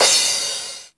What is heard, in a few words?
909 CRASH, TR-909; Crypton - DrumBase5000